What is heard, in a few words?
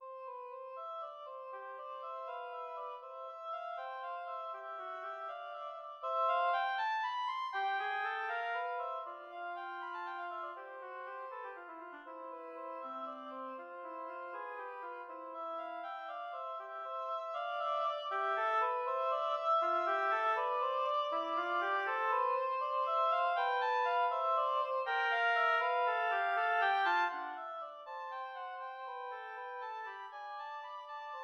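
study 2 oboes; J.J. Friedrich Dotzauer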